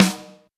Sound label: SD BONUS, Drums